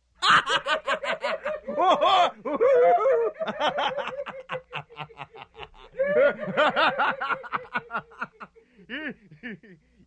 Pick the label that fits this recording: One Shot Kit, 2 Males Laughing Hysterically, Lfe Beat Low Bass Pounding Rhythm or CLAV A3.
2 Males Laughing Hysterically